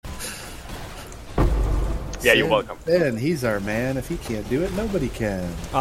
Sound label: singing